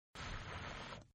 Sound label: Slurp Female, Drinking; Human